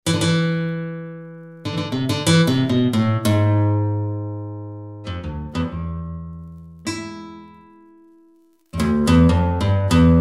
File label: guitar; ramirez